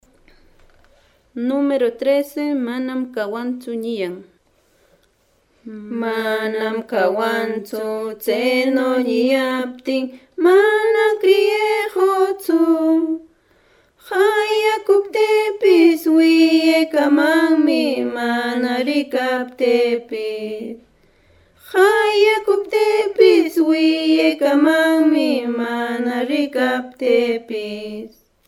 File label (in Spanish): cancion